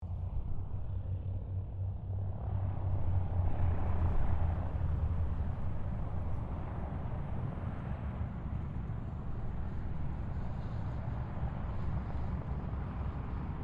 AIRCRAFT LOCKHEED L-1049 SUPER CONSELLATION TAXI DISTANT; Connie, Super Constellation; 1955; Airplanes